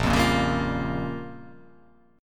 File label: A sharp Minor 6th A sharp down, chord